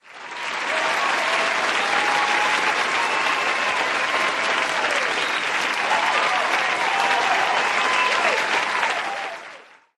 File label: win; RS Games Sounds; I Doubt It